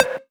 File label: Synths Root C; Vengeance - Essential Clubsounds Vol.4; Synth Shots and Basses; Note C